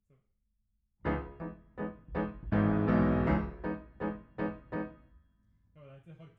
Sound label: Piano Mono